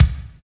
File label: studio kick drum sample D key